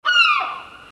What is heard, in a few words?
Seagull Call; creatures organic